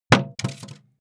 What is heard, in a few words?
drop left shuffled, large styrofoam compact block